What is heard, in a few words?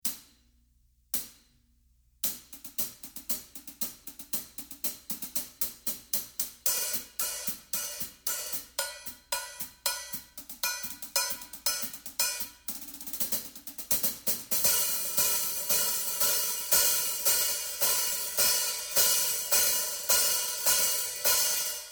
14″ XSR Hats